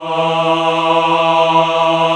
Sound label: CHO E2, CHOIR MALE A; Best Service ProSamples vol.55 - Retro Sampler; AKAI